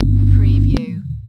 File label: Hi-tech Panel Servo Button